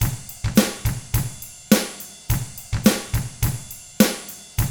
105 POP RK, Drums; ILIO; Double Platinum Drums 1

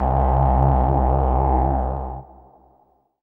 RAVE, RAVESYN

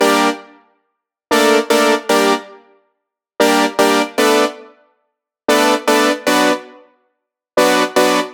115 G Synth Black Tea Stabs; Zero-G; Future Wave

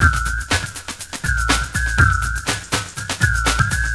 Ring Loop, Drums & Percussion, Electronic; Sonic Foundry (Sony Creative Software) - Electronic Point Blank

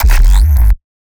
Glitchy bass growl

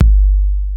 KIK, Cheez Kiks; Club-50 - Foundations Roland

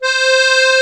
HOHNER, akkordeon

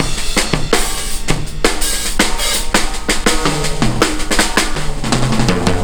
Stone Room 82 bpm, breaks; Zero-G Beneath the Planet of the Breaks

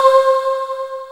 Q C-SY WAV H

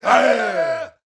BRAZIL, World Voices

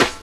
SNR RUFF SN, Drums; AMG; Now CD-ROM (Roland)

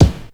Kick, Drums Percussion; Zero-G; Total Drum Bass

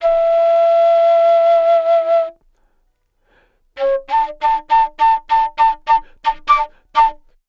Wood Flute, Asia

